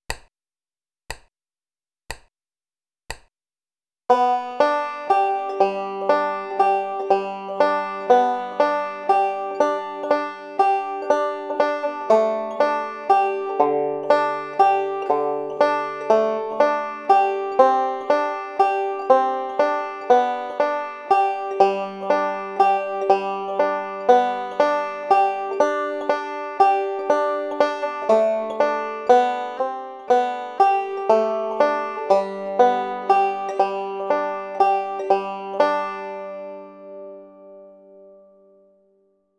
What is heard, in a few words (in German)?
skip to my lou mit forward rolls